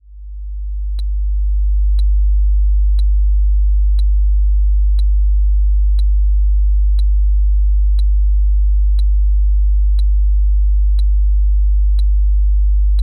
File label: 50Hz left + ticks; Audacity